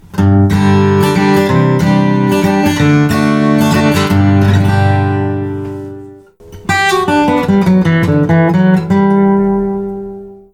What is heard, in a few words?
Buffalo Horn Picks Naturalnyj rog bujvola